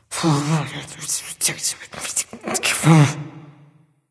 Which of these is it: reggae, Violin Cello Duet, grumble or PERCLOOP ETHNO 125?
grumble